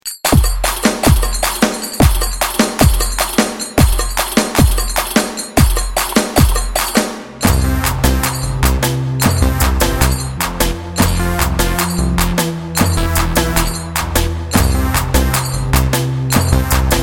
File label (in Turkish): 9/8, BK-5 roman ritim